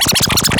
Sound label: SCRATCH TALK, Vinyl Scratches